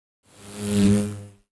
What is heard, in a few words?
wasp passing